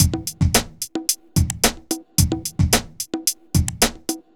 r n b kit 110

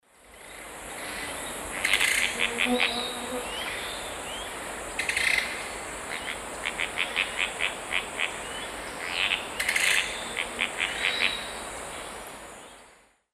Tityra semifasciata, calls; S. America; Eastern Lowlands

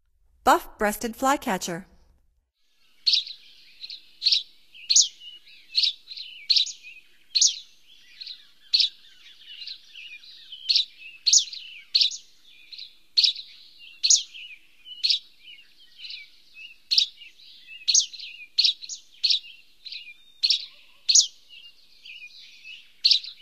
Buff-breasted Flycatcher; North America; Stokes

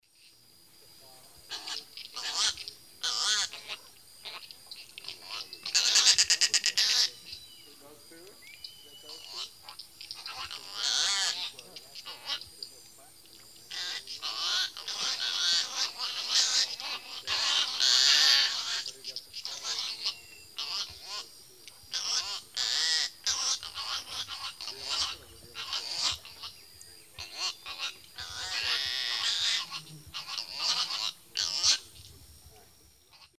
Rostrhamus sociabilis, calls; S. America; Eastern Lowlands

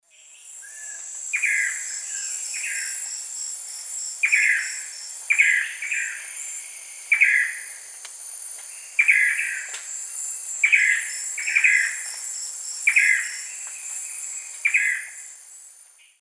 Chiroxiphia pareola, lek songs; S. America; Eastern Lowlands

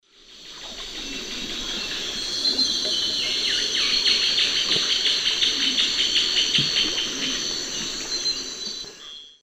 Chloroceryle amazona, song; S. America; Eastern Lowlands

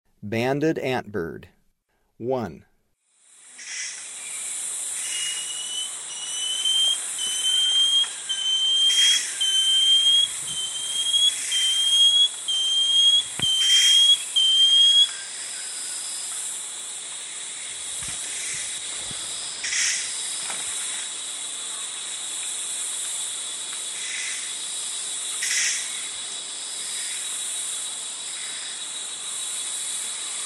Banded Antbird; S. America; Eastern Lowlands

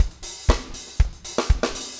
4 on floor 120, loops, 120bpm

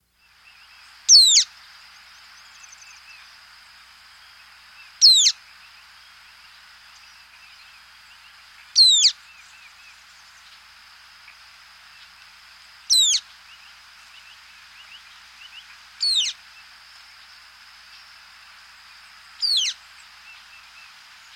Social Flycatcher; S. America

